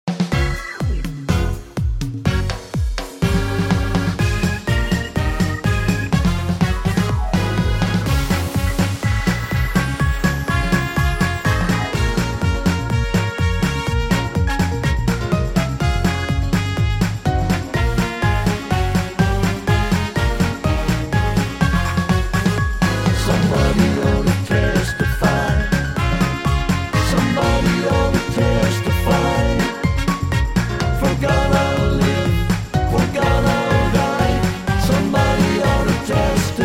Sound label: harmony sample